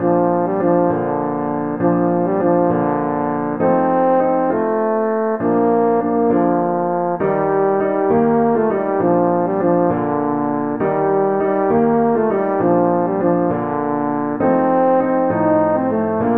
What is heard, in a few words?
silent night horn, french horn